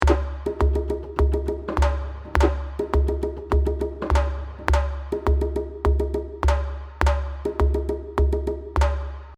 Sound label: bongo pick up the pace; Bongos 103bpm